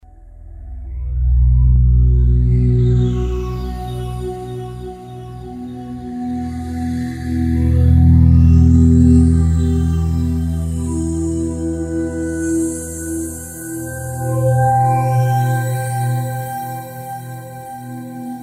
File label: Vectoring, Roland JD-990; Factory Patches